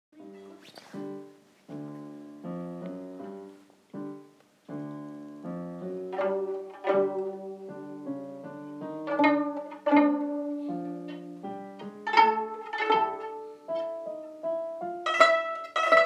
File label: violins